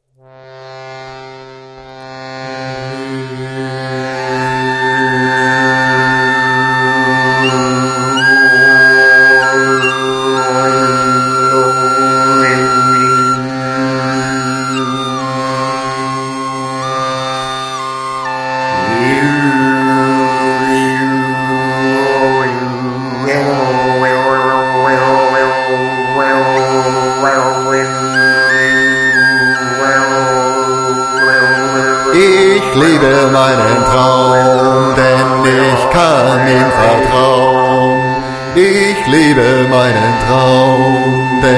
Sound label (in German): hintergrundmusik